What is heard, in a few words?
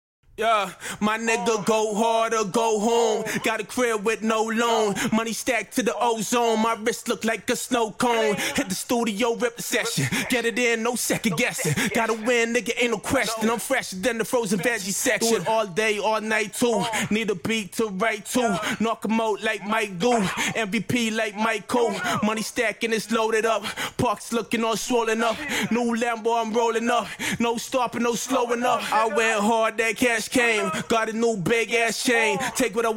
hip hop 140 Bm, acapella